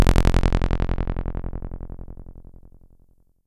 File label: BASS SH09 F0, Synths, Roland SH-09, Bass